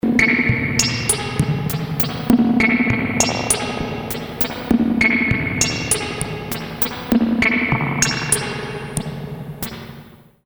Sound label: reverb